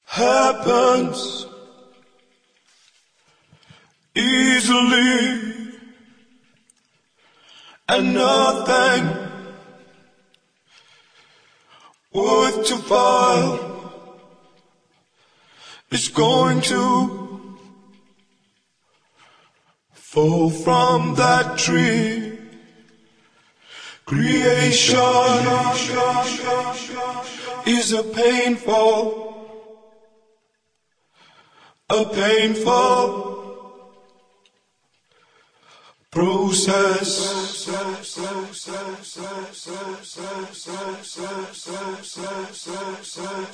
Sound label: acapella